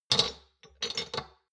shot glasses place multiple soft; soundpool

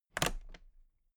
toolbox latches close soft

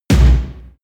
metal impact

